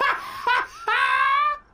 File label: ha ha ha; Voice; ooh ahh